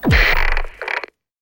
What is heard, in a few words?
Noise; Se Solo Noise 2